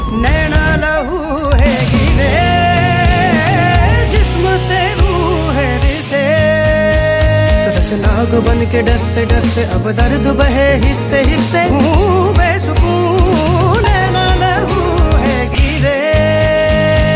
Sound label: ringtone bollywood ringtones